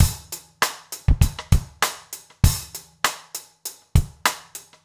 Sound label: basic funk rims